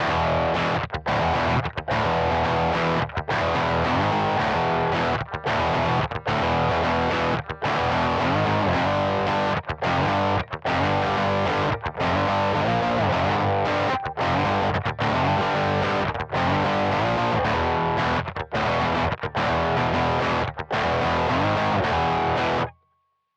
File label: Simplifier DLX Parallel MS Brit Lead KT88 412er